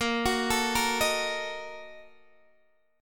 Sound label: Bb Major 7th Suspended 4th Sharp 5th, Guitar, Open D